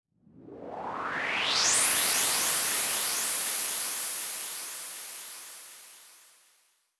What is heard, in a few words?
Solo Whoosh; Yu-Gi-Oh Master Duel